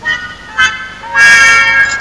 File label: melody